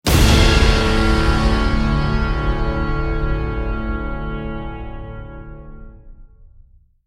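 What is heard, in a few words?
Music Stinger Snow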